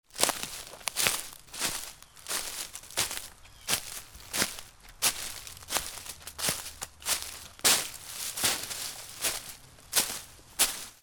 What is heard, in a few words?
FOOTFALL